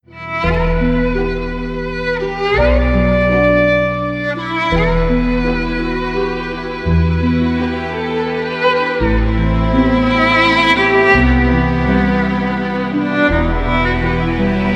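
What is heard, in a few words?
aziatskaya skazochnaya melodiya, Asian fairy-tale melody; Азиатская Сказочная Мелодия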